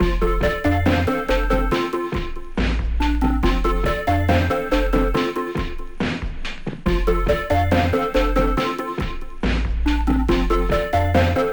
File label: call jingle